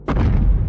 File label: step; walking godzilla dogday